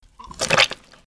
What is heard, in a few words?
gut, SQWLTCH